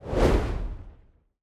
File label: arena spell draw start